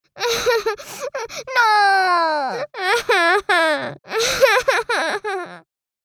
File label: Little girl crying